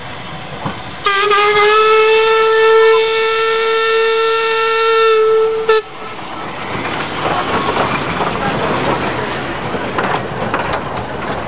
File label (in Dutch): fluit wielen; LaBretagne